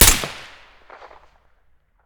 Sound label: svd silencer mono, weapons